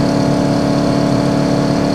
69 Mustang Loop, Automobiles

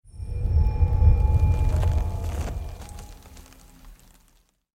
Fire Altar; Blind Quest - The Frost Demon

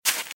Velociraptor - Footstep - Grass - Run - Right

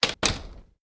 Door French Close; Home & Office; 1001 Sound Effects

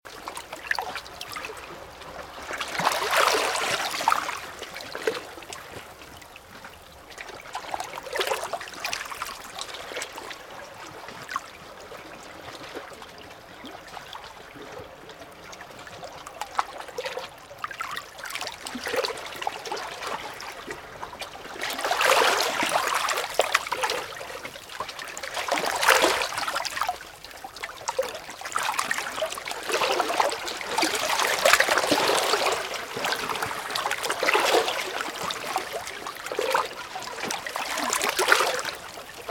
Ocean - Waves - Underwater; RadwinIsland

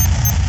Rocket Missile Loop; Weapons

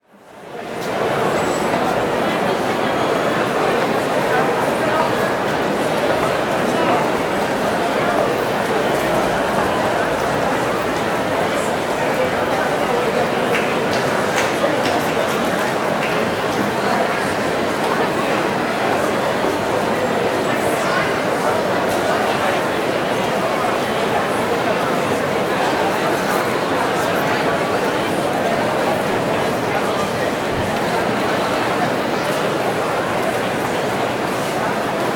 Crowd Indoor Large Hall, Walla

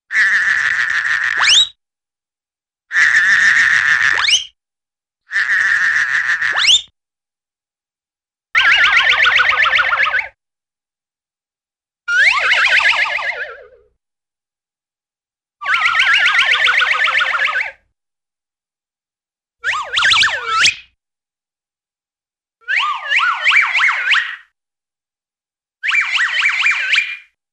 Rapid Whirling Twirling Whistle; Cartoon; Hollywood Edge; Cartoon Trax

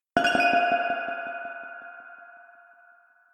Reaktor Drop; Inquisitor 3

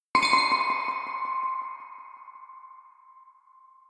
Reaktor Drop; Inquisitor 2

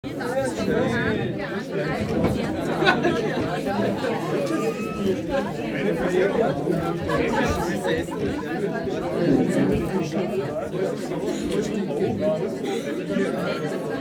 voices coffee, surroundings; best service Blue Box

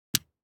DICE on Felt, Drop, Standard, 3 Three Dice; Samples; ArticulatedSounds; DICE Sfx Party (Extended)

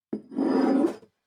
Shelf Jar

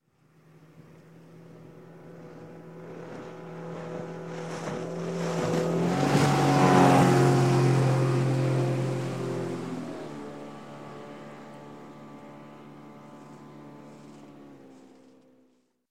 Boat Outboard, 50 Hp - Pass By, Fast, Marine; Sound Ideas; Series 2000

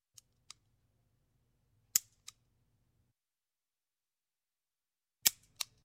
Gun, Hand Gun .38 Cal Police Service Revolver; Cocking; Sound Ideas 2000 Series

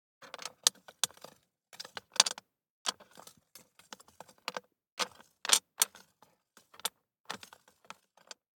DICE on Glass, Slide, Standard, 5 Five Dice; Samples; ArticulatedSounds; DICE Sfx Party (Extended)

